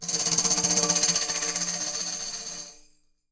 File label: HEADED, TAMBOURINES; Voice Crystal - Steve Reid Definitive Percussion